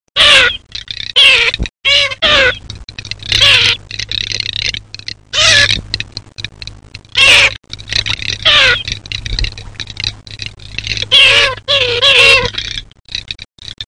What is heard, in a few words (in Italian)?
Marzaiola